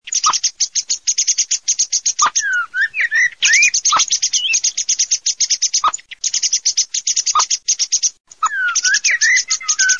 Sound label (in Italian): SCHIAMAZZO MERLO